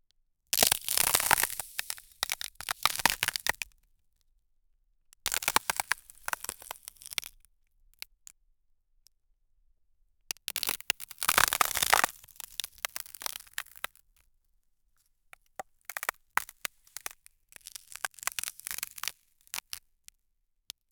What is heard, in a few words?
ice cracking sanked into